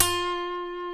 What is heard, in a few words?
G, funk guitars